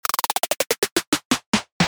drum pitch

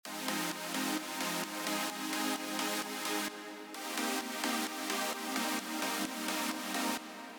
chord

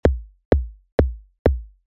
kick glue

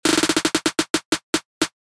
drum no pitch

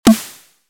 snare pre

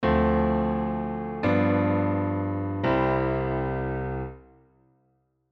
Negative Harmony Perfect

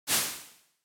snare tail

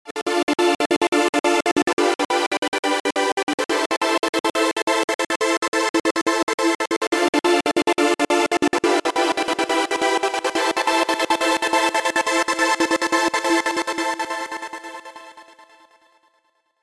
pad gate; Ableton Live; Creative Extensions

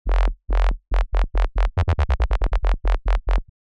growl

